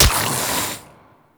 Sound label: Tendrils Embed Hit; Warframe; Warframes